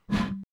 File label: PRC BOTTLE, Bottle Blws; Roland - LA Composer vol. 2